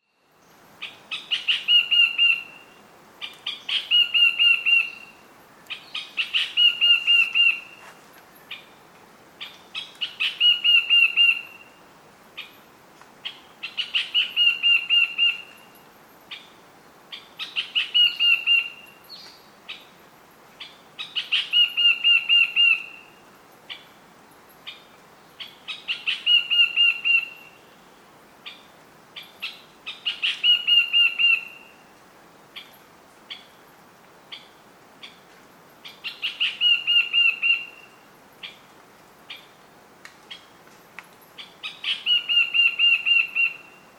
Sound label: Fantail Sound